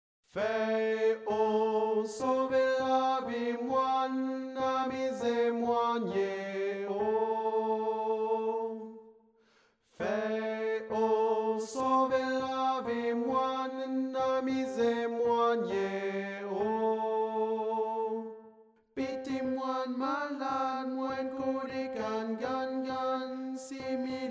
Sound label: Fey TENOR